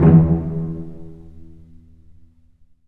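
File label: cello pizzicato E1; Digital Sound Factory Virtuoso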